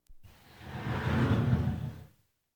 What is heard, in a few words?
Household Phones